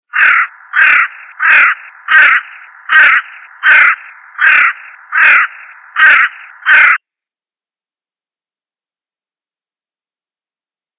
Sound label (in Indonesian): burung hantu